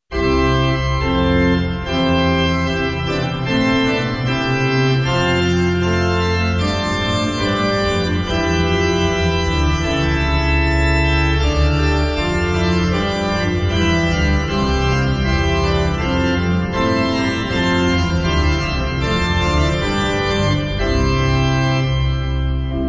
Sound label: Trumpet Ensemble; Bach Trumpet Ensemble of Munich; Sonata 'Ad Festum Paschatis'